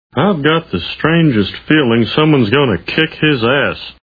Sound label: kick